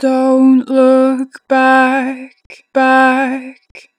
Don't look back, 2 bar vib, Vocals, Natural Vibrato; Harmor Vocals